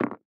symbol bounce; goldentavern

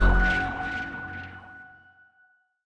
staxx Blink Main; neon staxx

